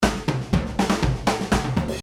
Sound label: fill